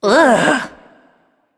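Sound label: ugh, female; smite; voicepack; hera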